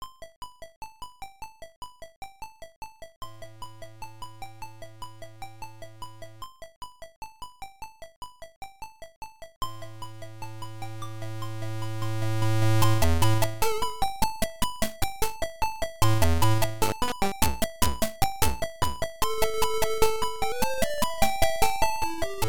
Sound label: Tubular Bells DoorBell Version; ST-Module; Blip Music!; Systeme D; Palm Coding